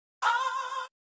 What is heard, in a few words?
augh autotune